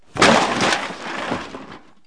Large Garbage Crash, Cartoon; Crashes & Crushes

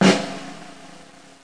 drum roll

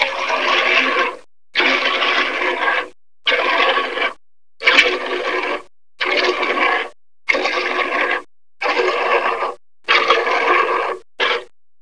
Milk Cow; How Would You Survive (1995); Grolier

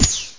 ARROW; PwrSlave

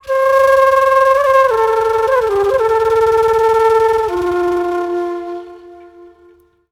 FLUTE ETHNO 125; eJay

